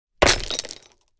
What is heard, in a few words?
Rocks and various debris thrown